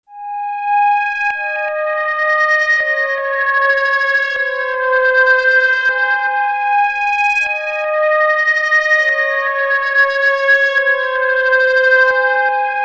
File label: Synth Tape; Source Audio Nemesis